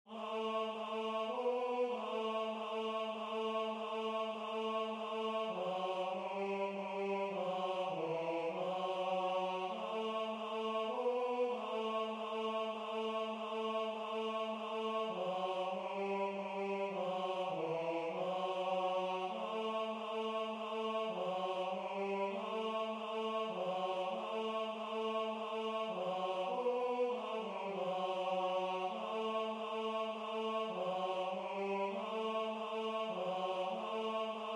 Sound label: Tenor